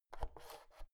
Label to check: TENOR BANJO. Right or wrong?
Wrong